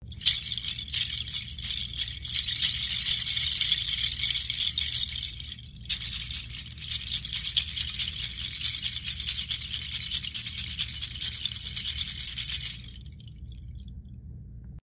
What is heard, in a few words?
Kenari Seed Shaker Small